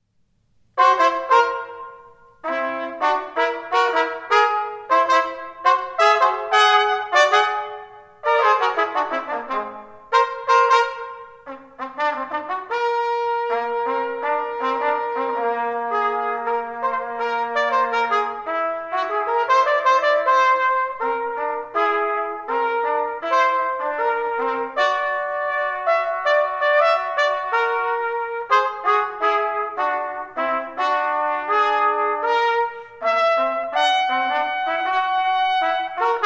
Tons inteiros Duetos para Trompetes

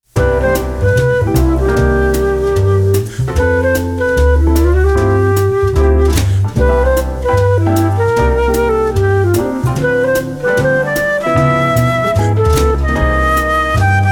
Flauta; Memoracoes

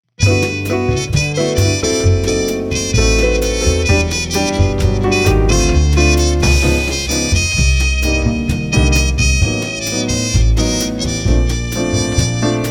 Trompete; Encontros

